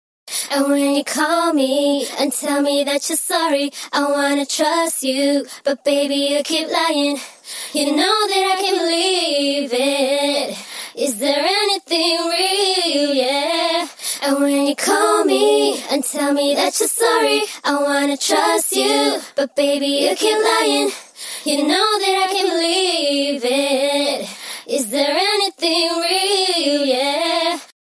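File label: hip hop female sing 140 D sharp minor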